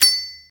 UI Tutorial Task Complete

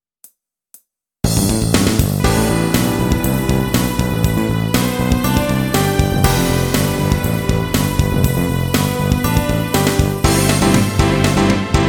Bass Minus Track

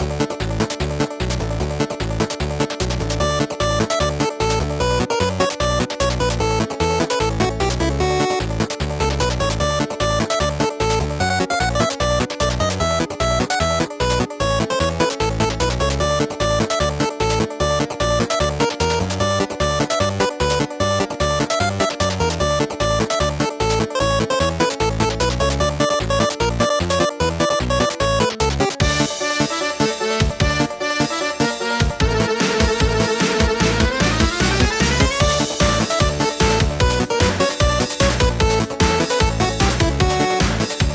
Banjo; Cosmic Break

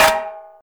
Impact Small Metal Hits

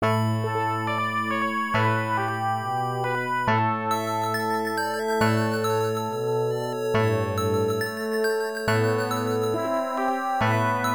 Peaceful; Growl; Runark; Genesis

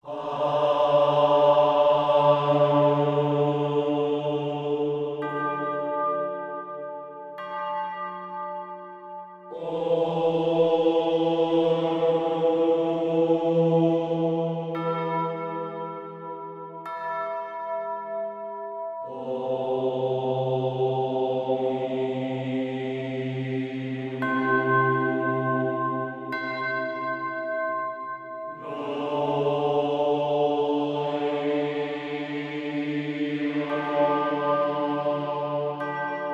Sacred Chant Male With Bells

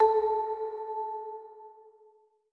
marimba; Sky Children of the Light gamerip

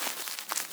Queen Roll Loop; we are the dwarves gamerip 2016

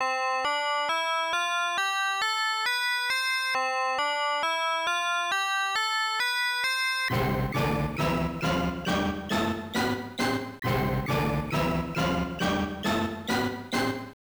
Stereo Test; X-Men; Arcade

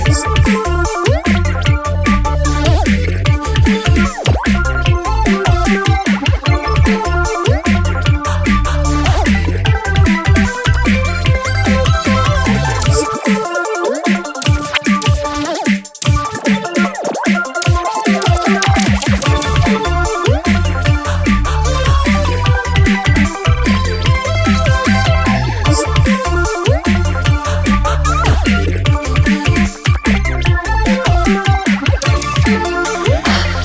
Drum Loop; Molemen Must Die; Out of Control

